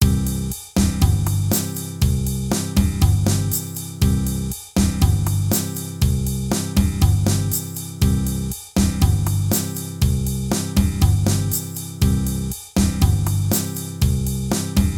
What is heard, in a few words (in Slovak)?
Bossa nova perkusie